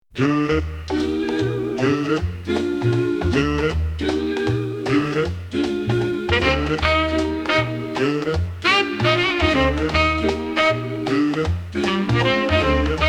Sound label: boog wop